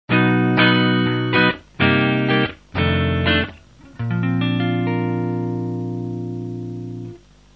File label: ii-v prog